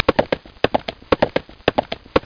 Gallop; Equivision: Polo Thrills

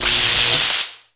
shock; BESTSHOT; noisybit

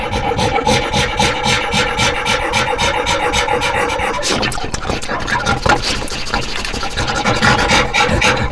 stand up, guard; Zaero; monsters

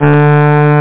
SAX; lemmings

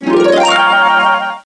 buttons; billyboy

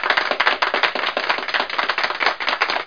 clapping; Widget Workshop; Maxis

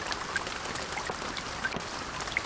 rain

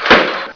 thump; probass

